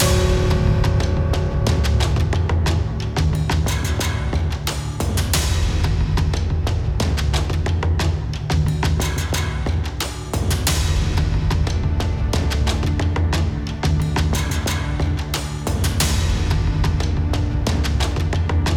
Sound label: ready to battle, nhac game